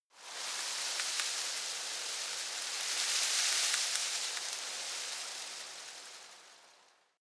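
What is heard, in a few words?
shrub little leaves rustle soft, garden; haus-garten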